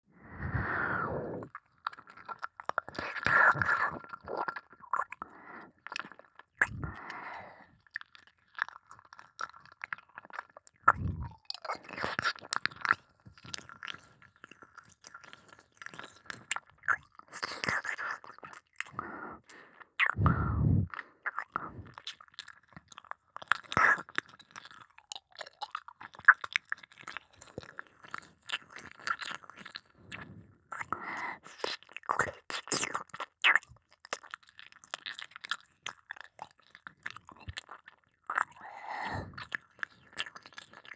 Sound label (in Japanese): 耳舐め・普通・左耳